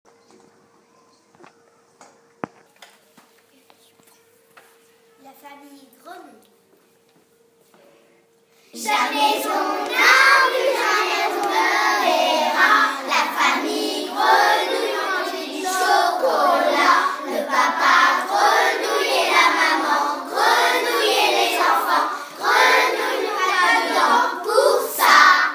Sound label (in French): grenouille